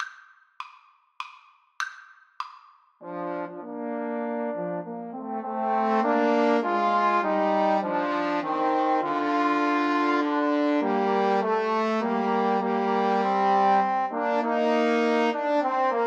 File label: Trombone Trio; mendelssohn nocturne